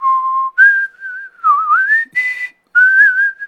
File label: HUMAN WHISTLE; MUSICAL; lego star wars the force awakens; ps4